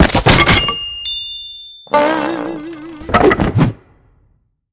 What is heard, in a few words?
trap level fail; The Croods